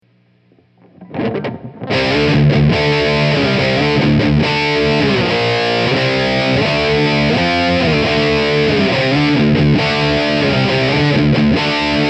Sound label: DSL PT Lead, Marshall; Marshall DSL Transformers